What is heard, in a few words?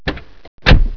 CAR DOOR